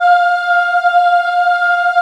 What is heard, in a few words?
F4 CHI OH -R, Choir; USB Soundscan vol.28; Acoustic & Synth; AKAI